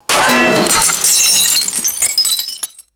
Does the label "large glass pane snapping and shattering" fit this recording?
Yes